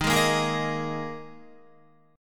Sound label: D sharp Suspended 4th, Guitar